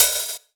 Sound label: Open Hihat; Vengeance Samples; VEE Cymbals